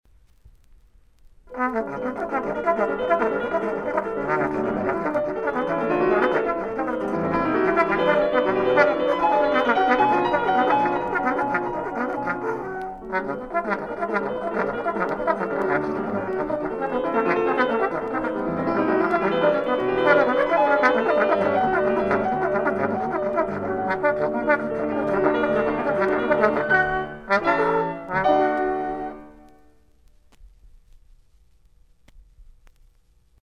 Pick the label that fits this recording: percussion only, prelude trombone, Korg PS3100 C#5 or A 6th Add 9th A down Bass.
prelude trombone